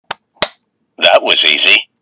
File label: Easy Button Sound Effect; Staples